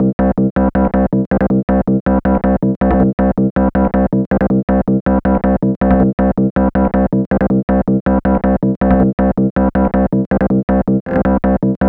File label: gro 160, Hardcore Loops, Bass Loops; Dance Synths